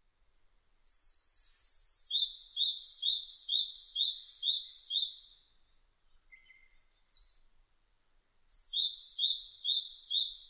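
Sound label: Japanese tit; sijyuukara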